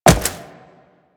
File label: Wall Jump Default; Footsteps; Warframe; Tenno; Wall Run